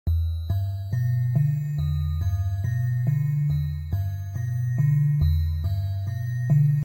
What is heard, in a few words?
horror; Sprunki Incredibox; Garnold is a mysterious character in Incredibox Mod Sprunki, known for his golden suit